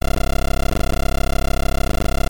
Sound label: high frequency noise